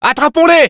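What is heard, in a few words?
battle cry, French; Scout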